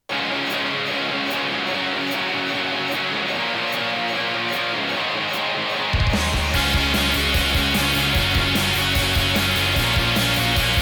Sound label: rock gold plated tape; tck333esj